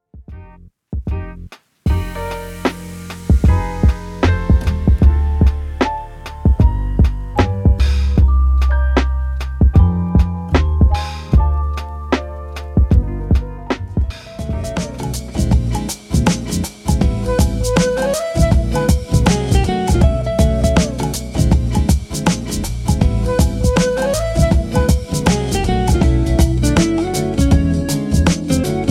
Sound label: Lofi Beats; Cafes